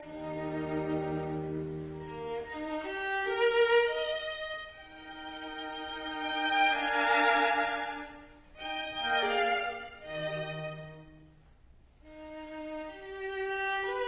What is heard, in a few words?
Mozart String Quartet in B flat major, Adagio; K 458; Hunt